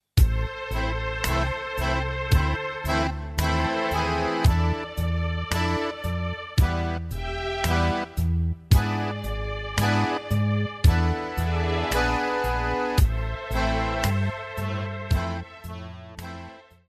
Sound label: Bandoneon